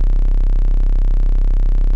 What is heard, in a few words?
noise loop; desert run